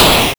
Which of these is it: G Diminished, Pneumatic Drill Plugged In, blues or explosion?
explosion